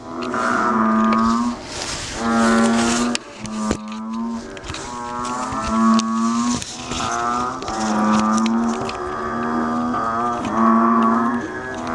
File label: Banded Bull Frog Call